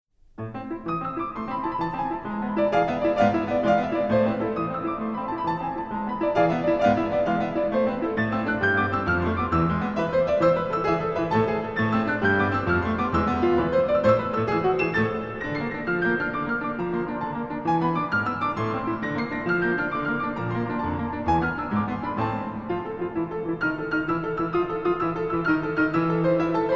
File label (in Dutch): tarantella napolitano 4 handig piano